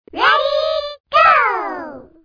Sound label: ready